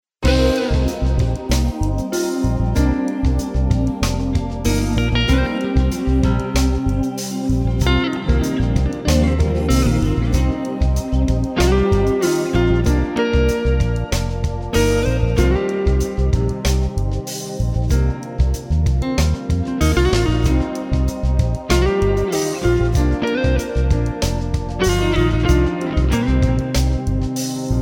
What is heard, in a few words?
contemporary jazz